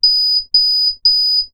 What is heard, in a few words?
Proxy Beep; metal bullets; weapons